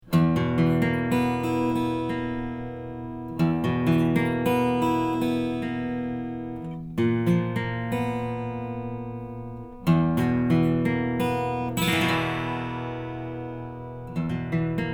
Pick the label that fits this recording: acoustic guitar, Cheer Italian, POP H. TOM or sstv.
acoustic guitar